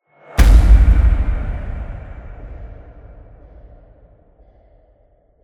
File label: Mission Failed